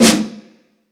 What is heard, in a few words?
Stadium Rox, Drum Machines